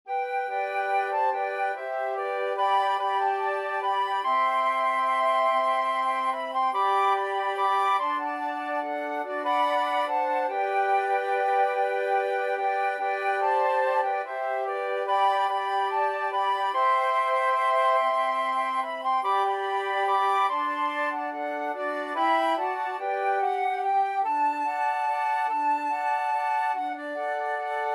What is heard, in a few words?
wild rover, flute quartet